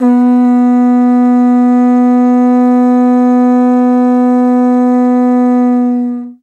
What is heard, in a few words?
CONCH MID, World Percussion